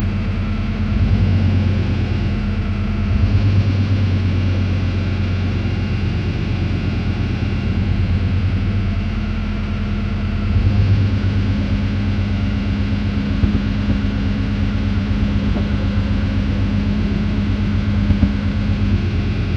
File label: truck loaded and driving